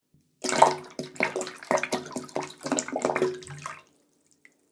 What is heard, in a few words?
Water Bubble Sounds